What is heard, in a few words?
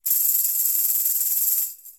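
Tamborim FX; Latin Percussion; Drums; Drum Hits; Tambourin; Ableton; Factory Packs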